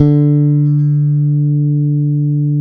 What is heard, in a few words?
BASS D3, Electric Basses; E-MU Producer Series Vol. 8 – Platinum Phatt